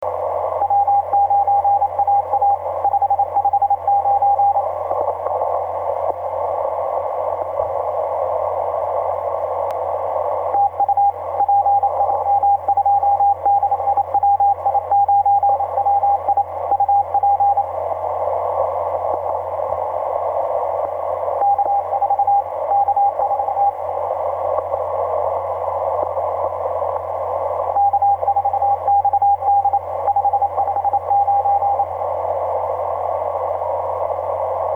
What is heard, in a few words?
30m cw; pj2-wi9wi; w9aa